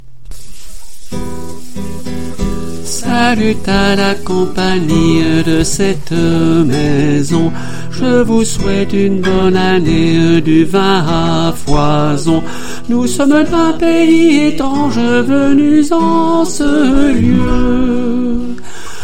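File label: soprano, chorale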